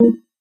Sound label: UI Test Tone; we are the dwarves gamerip 2016